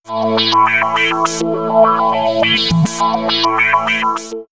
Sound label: Swirls Sample & Hold, pads; X-Static Goldmine